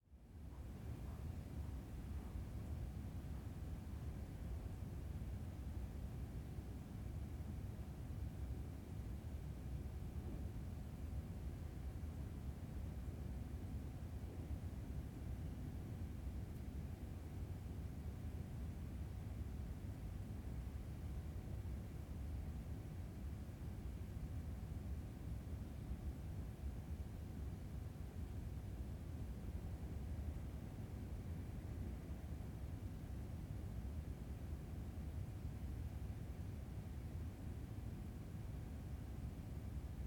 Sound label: Room Tone Large Room Library